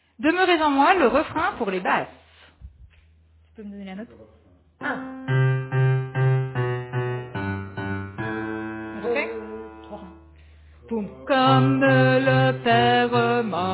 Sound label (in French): Basse